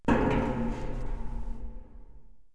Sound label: player duct; Group Mapping Project